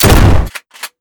shotgun pump shot

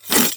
swing sword

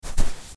footstep sand

